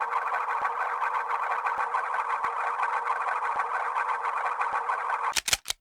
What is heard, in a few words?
gun spin; aai2; knightley